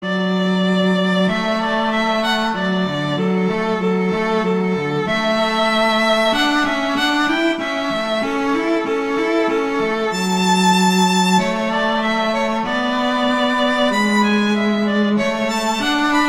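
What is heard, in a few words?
Duet, horn; Mozart; Duets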